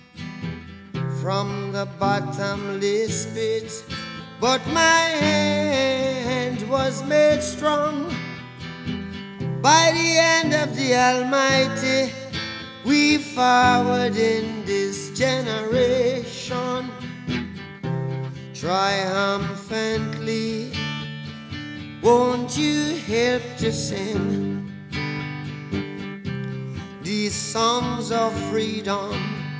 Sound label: reggae